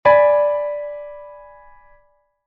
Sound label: G♯°, Chord Inversions